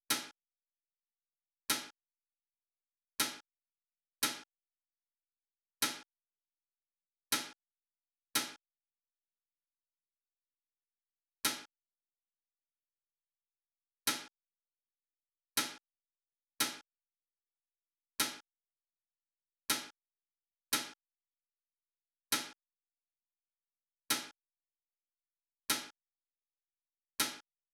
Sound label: hat